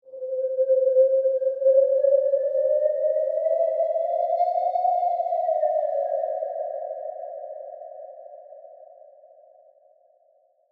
Cartoon Ghostly, Horror, Cartoon, Ghostly, Howl, Eerie, Haunting; Ghosts; BlastWave